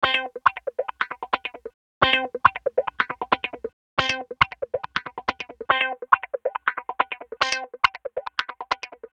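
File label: Tube Benders Dry Clean Distortion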